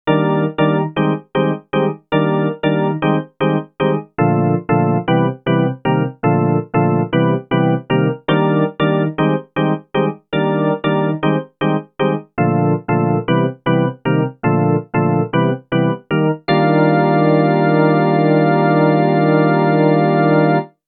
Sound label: Organ; Elektron Digitone